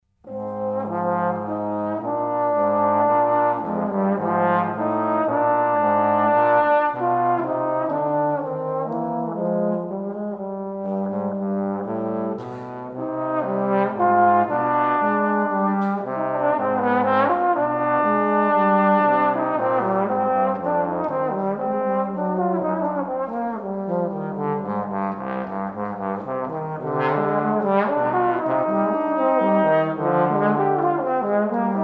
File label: Duet II